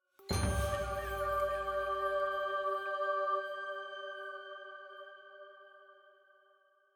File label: item Jingle Full+ Choir Flute G